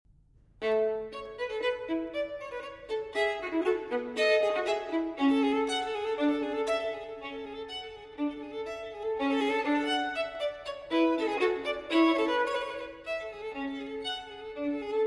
Solo Violin in B-Flat Major Allegro; No. 1; TWV 4014; Telemann Fantasia

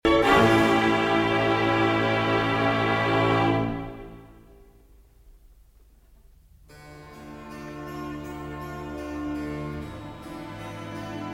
Violin Concertos, Concerto in D minor

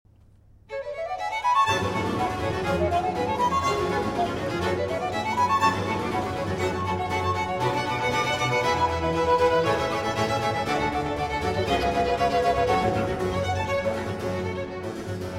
Flute Concerto In G Minor

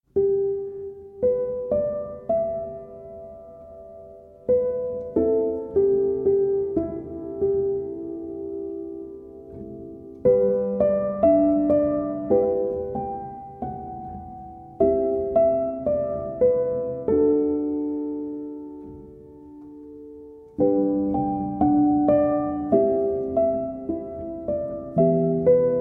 Arr. For Piano - A Calm Version; From The Cider House Rules

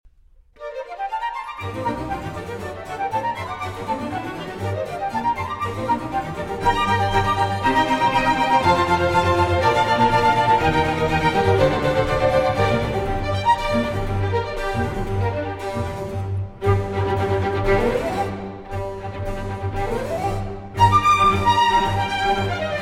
Flute Concerto in G Minor

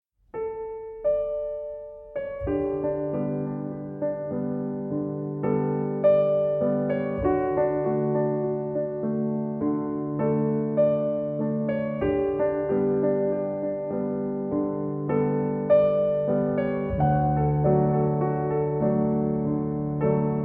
Piano Suite

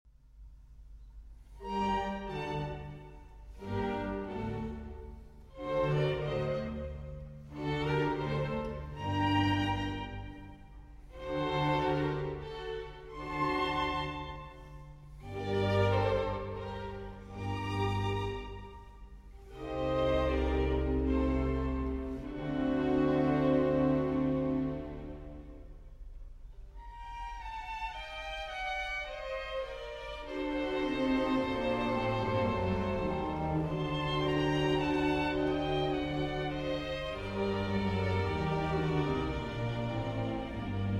Concerto For Violin And Strings In G Minor

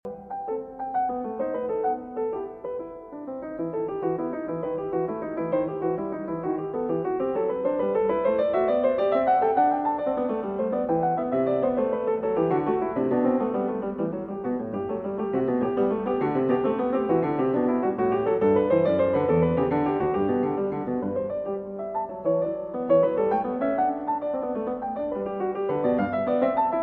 Partita No. 6 in E Minor, Tempo di Gavotta; BWV 830 VI.

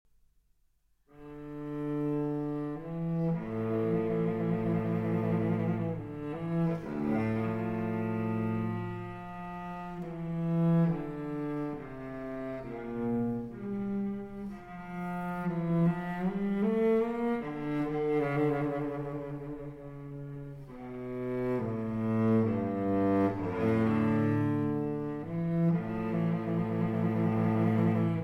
Cello Suite in D Minor, Sarabande; Cello Suite No. 2; BWV 1008 IV.

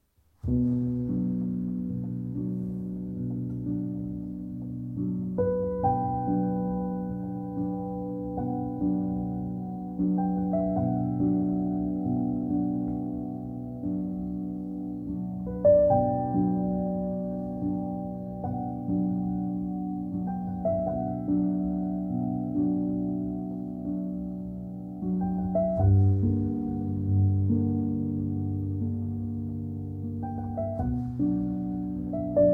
Wind Song

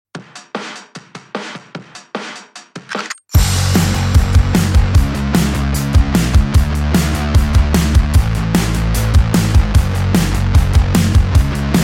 Karaoke Version

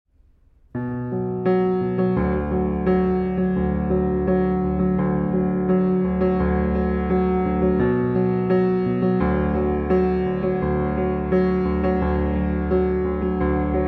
Acoustic

